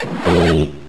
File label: Swallow; pokemon reborn e19 2022